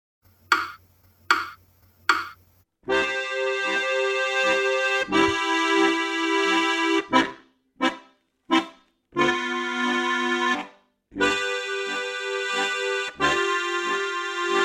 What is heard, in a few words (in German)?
Walzer C-Dur; Schule; hoerbeispiele begleitheft